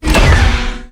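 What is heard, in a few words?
Npc Crane Machinery Damage Die; FlyForInsanity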